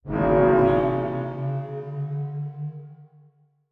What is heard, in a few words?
Production Element Title Transition Demonic